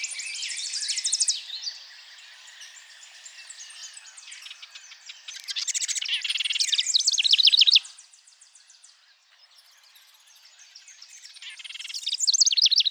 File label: House Wren Song